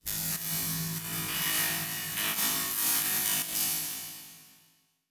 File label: Transition Reverse Surge Reverse; Production Element; Title Transition; AdobeCC